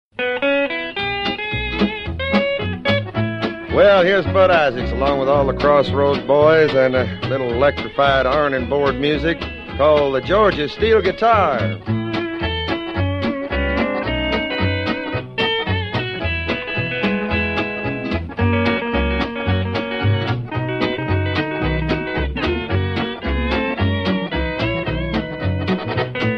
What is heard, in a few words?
Steel Guitar